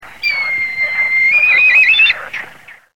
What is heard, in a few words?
Milvus milvus